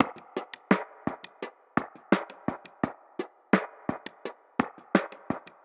Space Perc Loop - 85 BPM; Cymatics; Fiji Hihat Loops & Percussion

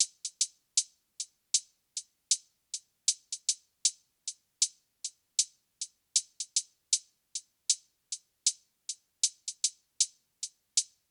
Hihat Loop 78 BPM, Lofi; Glue

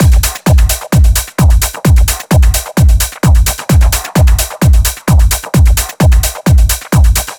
Drum Loop 130, Techno; Ghosthack; Free Techno Sounds 2024

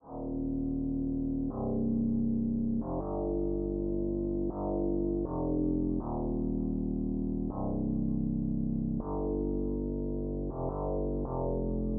Nostalgic Melody Loop 160 BPM G Min Bass; Loop Stems; Cymatics; 2020 Melody Collection

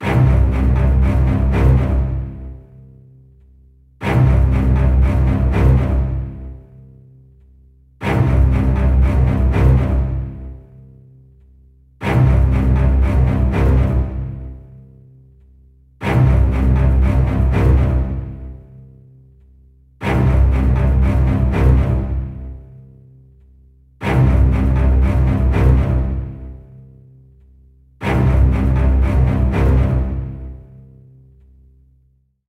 Basses - Cm 120Bpm; Construction Kits; STEMS; GhostHack; Ghosthack - SkyRealm; Kit 12 - Ravensthorn Manor

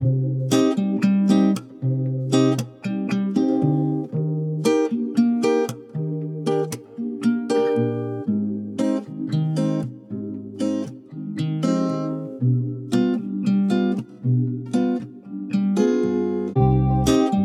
116 BPM C Min, Guitar; Encantadora; Cymatics; Miami Guitar Pack - Pt 1

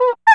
clown horn dampened short, Cartoon Film Effects

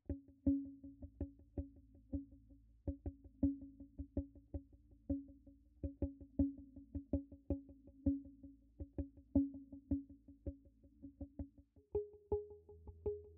162 BPM E Maj Guitar; Loop Stems; Cymatics; Miami Guitar Pack - Pt 1; GPS

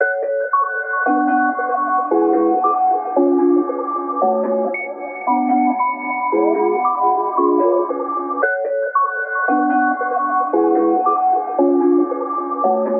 114 BPM F# Min Warble Piano; Trap Melody Loops; Loop Stems; Cymatics; Nightmares; Fireflies

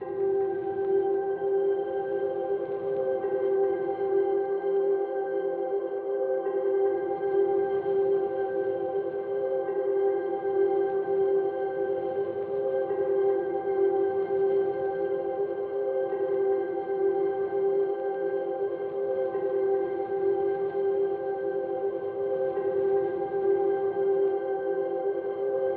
149 BPM F Min Pad; Loop Stems; Cymatics; 2022 Melody Collection Platinum Expansion - Pt 2; Pearl

